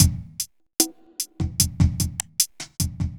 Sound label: evil beats 75